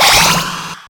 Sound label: power up; hexagon glitch 3